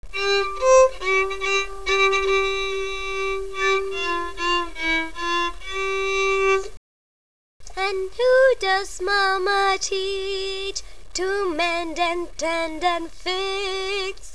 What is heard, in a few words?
Voice On Violin